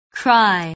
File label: cry